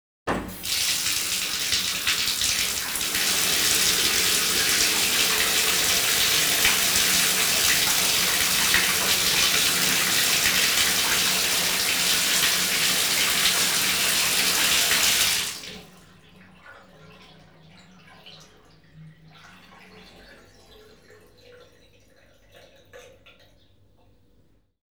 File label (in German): ein- und auslaufendes wasser (badewanne); geraeusche digital